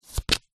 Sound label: leisure playing card turn over on top of deck